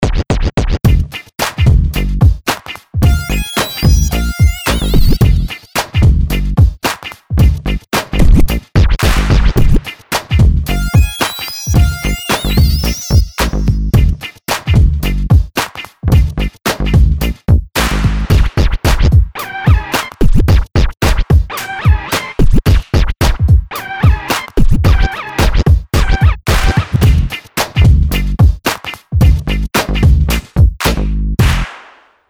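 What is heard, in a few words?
break it down track no vocals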